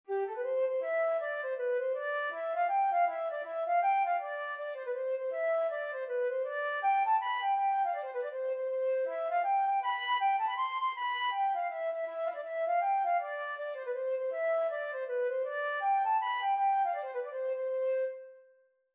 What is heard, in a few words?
flute